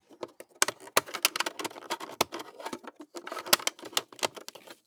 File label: radio dissassemble; anemoi